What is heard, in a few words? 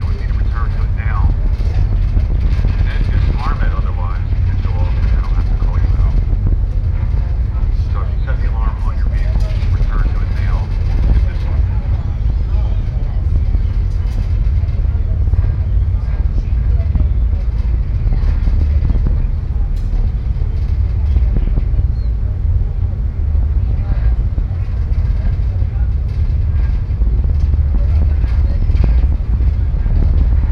binaural usa ferry